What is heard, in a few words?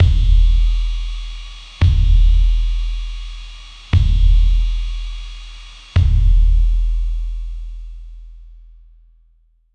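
drum laser fx beat machine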